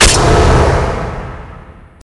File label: fan of knives; csgo; wcs go